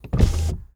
servo window; soundmorph

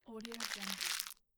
candy bar packaging crush

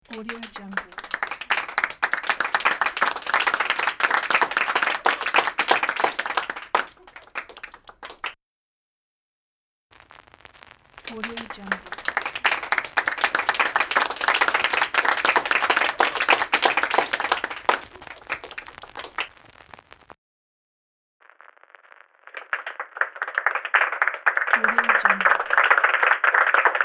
building applause

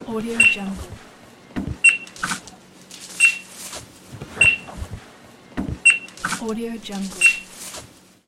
supermarket; crisstyna

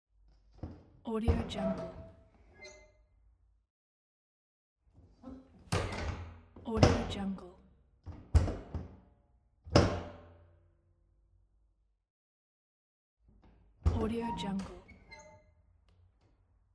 box truck cargo area door from the inside

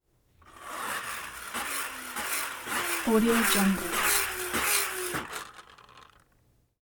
toy car revs; prosoundfx

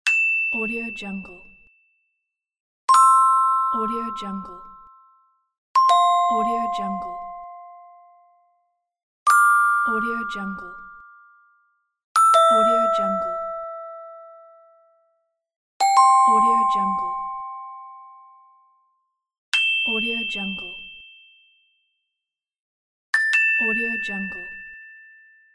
new message cling pack

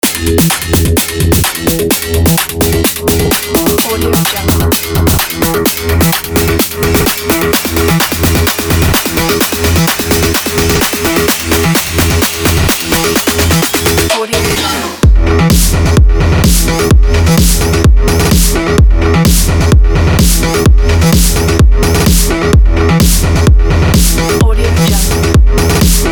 cyberpunk futuristic edm

